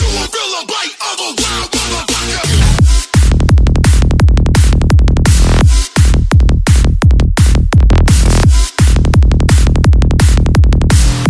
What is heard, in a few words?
wild machines 170 bpm trap